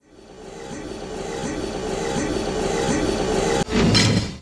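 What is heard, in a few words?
elevator arrive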